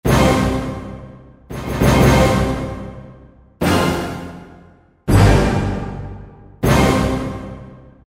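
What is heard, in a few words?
Minor Hit, Roland SR-JV80-02 Orchestral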